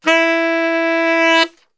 E 2 SAX, Brass Super Section; Best Service

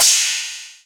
Roland R-8 Crash

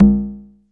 MORE CONGAS, DRUMS; Music Store Sound Service; Einstein

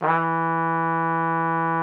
E3, TRUMPET; Best Service ProSamples vol.42 - Session Instruments; PS-42 Session Instruments

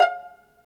STR PIZ VL, String Vlns Pizz; Roland - String Master Series

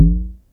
SYNCUSSION

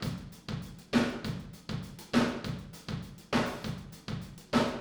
Dancehall 100 - Verse - Room, Drums; Sonic Foundry (Sony Creative Software); Drums from the Big Room The Mixes; Demo Projects

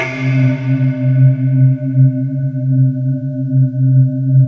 bowww C3

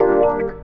E. PIANO, KEYS

